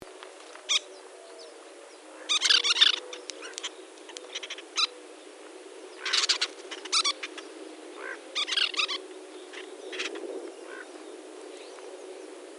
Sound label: Western Kingbird, Tyrannus verticalis; flycatchers